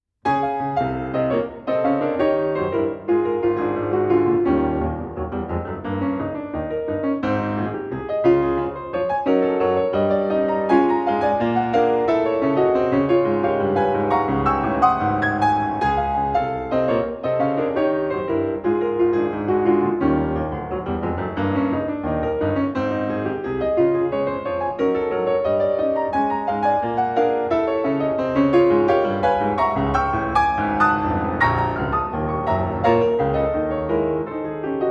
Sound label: rags no. 6 d-flat major presto reckless boisterous